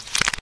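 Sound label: stomach close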